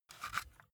cassette case take out soft; objekte; kassette

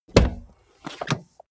car bmw e46 exterior door front open full; Cars

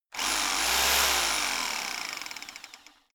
rock drill chisel burst rev up long; maschinen; schrauben drehen hydraulik

